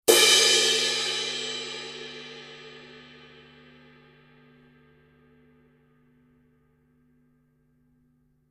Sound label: color sound 900 20 purple heavy crash edge, paiste